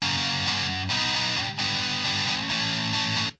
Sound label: bad indie, guitars